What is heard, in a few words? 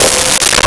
Pizzicato C5; Nintendo; July 2020 Gigaleak